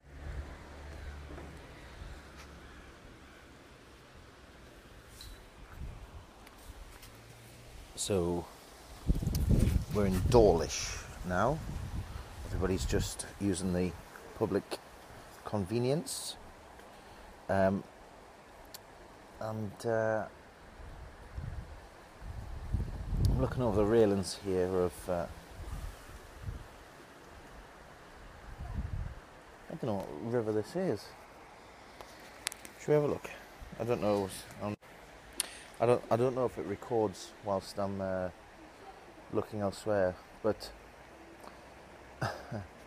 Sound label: Ice cream and clotted cream, eating noises; Dawlish; travelogue; WARNING: Minging eating noises